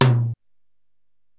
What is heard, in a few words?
505 Drums, Roland TR-505